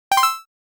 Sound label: digital coins